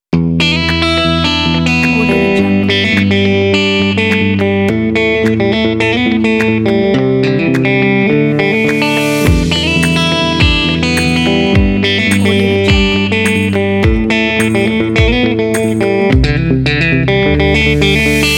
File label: country